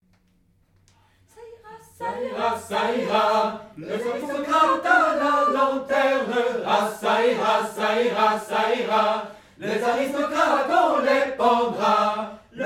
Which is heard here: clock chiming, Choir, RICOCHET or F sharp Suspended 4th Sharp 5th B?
Choir